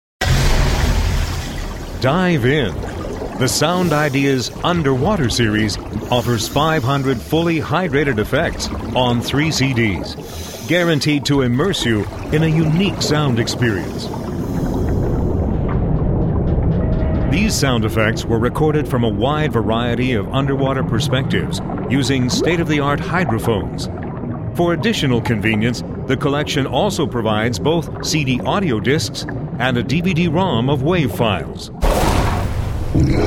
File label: underwater